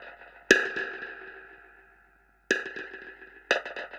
Drum Kit Echo Snare 120, dub drums samples, 120bpm; musicradar